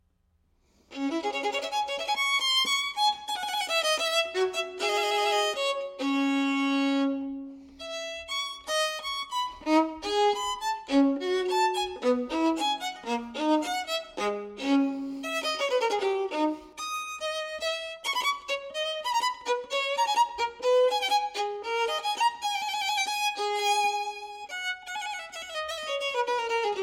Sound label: Baroque Strad